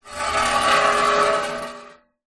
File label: foley metal large frame scrape drag screech concrete